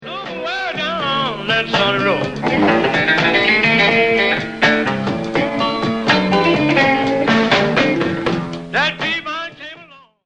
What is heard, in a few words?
blues